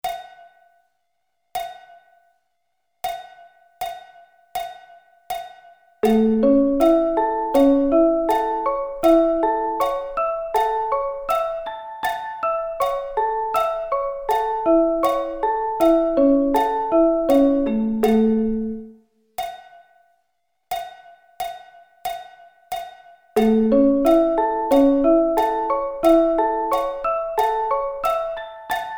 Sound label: A Arpeggio Inversions